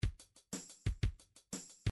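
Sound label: midi drums, blues rock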